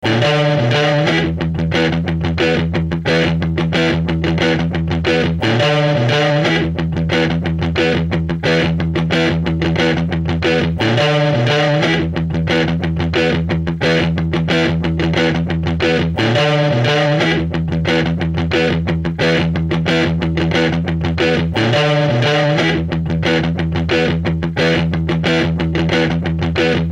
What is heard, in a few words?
rock guitar music